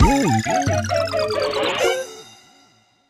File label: Jingle Dropped Hurry!; Plum Dropped; rayman 3 hoodlum havoc gc ps2 windows xbox 2003